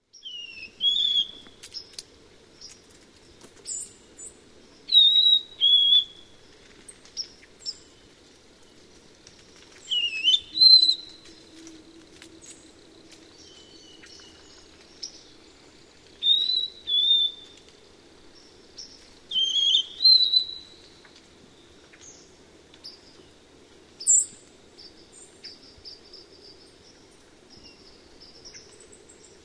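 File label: White throated Sparrow October babble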